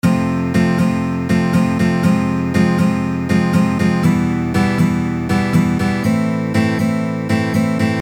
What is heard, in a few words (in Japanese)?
サブドミナントマイナー; oudo progressions 11patterns